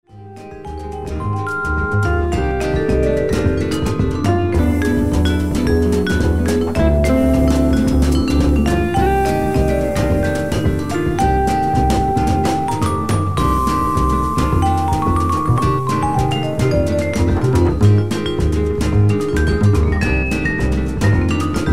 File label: bahia bossa nova